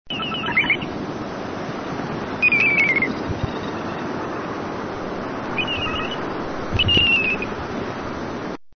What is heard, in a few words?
Eurasian Dotterel